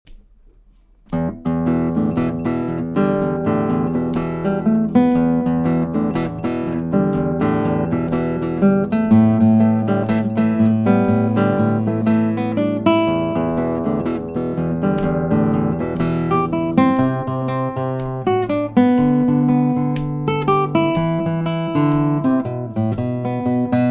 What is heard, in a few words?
Rowdy Rock